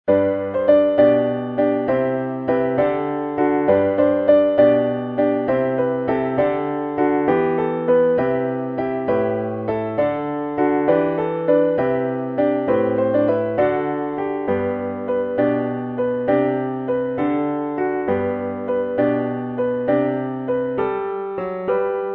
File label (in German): Klavier; Tell me where is fancy bred; William Shakespeare